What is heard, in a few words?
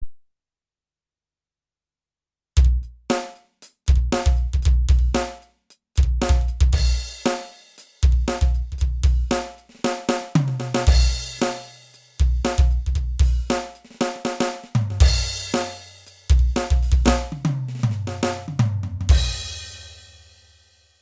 slap bass; Roland TD-8 or TD-6/6V; Elite